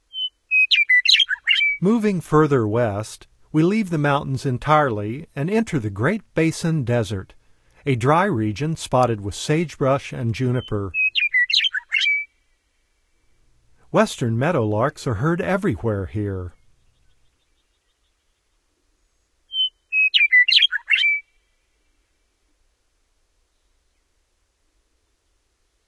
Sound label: Western Meadowlark, Bird Sounds; North America; Common Western Species; Know Your Bird Sounds